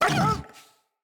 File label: Kubrow Feral Vocal Pain